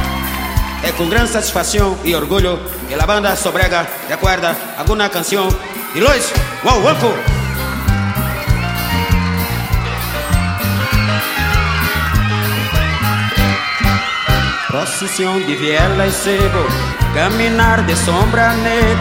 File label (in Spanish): cumbia de colombia